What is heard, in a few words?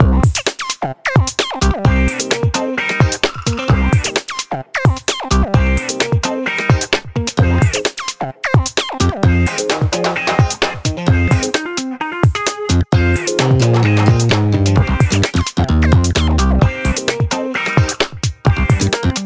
Funk; MTV Fan Attack